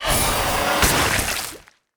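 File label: evil Wind Wolf attack; monster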